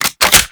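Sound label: crossbow Reload Clip In; Warframe; Tenno; Primary Xbow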